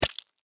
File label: Insects Bug Hit Windshield; Miscellaneous Insect; Animals